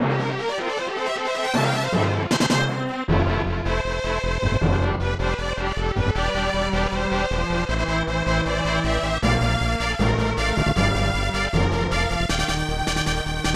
Course Clear (Orchestra)

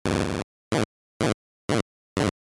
Laughs; The End - Krang Laughs

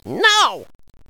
No Squeak

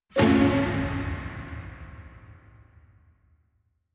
Scary Reveal

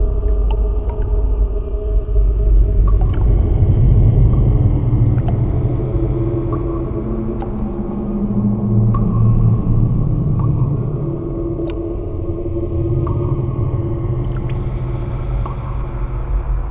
cave ambience; gauntlet seven sorrows

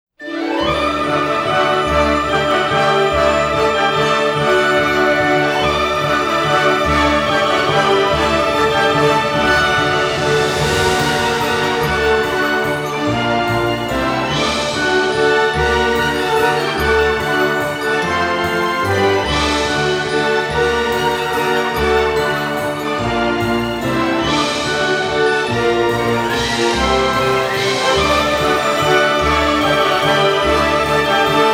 Heroic Fanfare; lineage ii chronicle ultimate selection

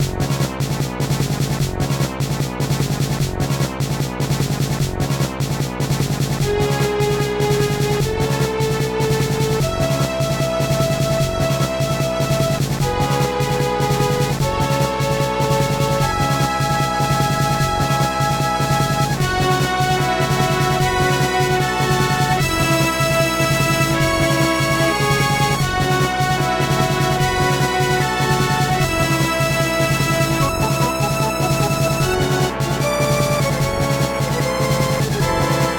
Boss Music

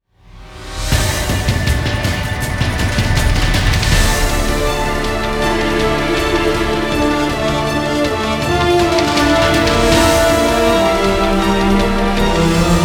Front Gate; Homefront

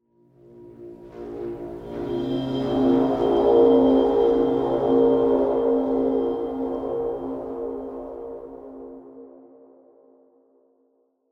Marimba and Cymbal

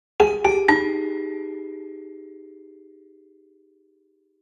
Marimba; Arc Apellago

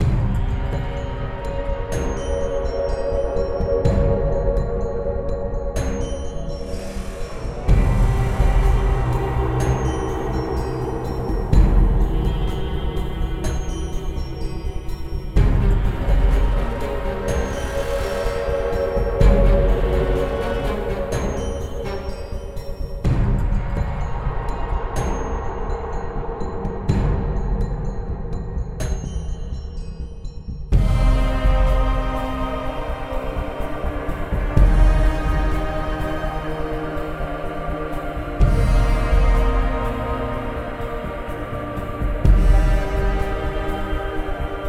Fire Tier3 Exploration; Battle Breakers

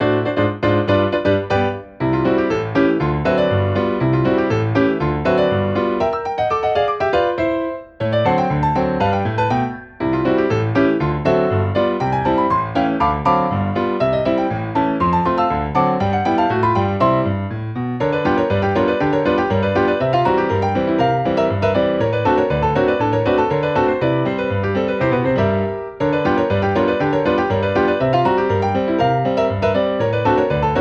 Black Cat Hour Piano; Nora, Princess, and Crying Cat.

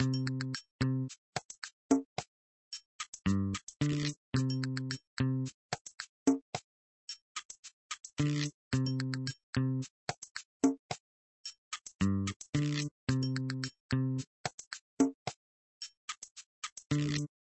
Orderscreen Music; papas cupcakeria online windows gamerip 2013

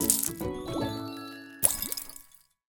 Gem Jingle; SpongeBob Krusty Cook-Off; android

